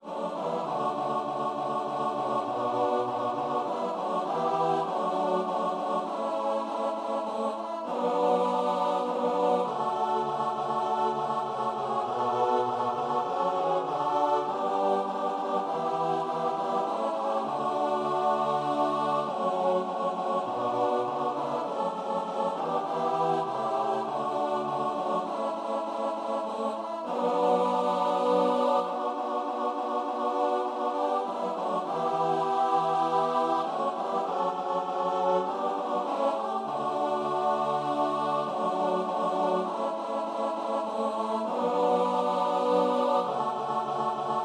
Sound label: choir; jana gana mana